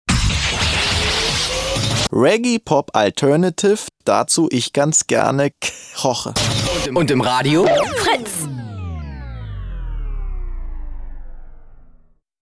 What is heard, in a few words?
Reggae Pop Alternatiff, jingles; Fritz